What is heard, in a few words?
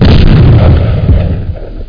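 engine explosion; Team17; Phoenix Demo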